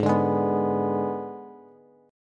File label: Ab Diminished Ab Bass; Abdim strummed; JGuitar